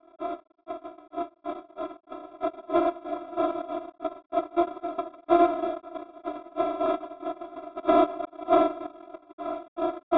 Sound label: Jazz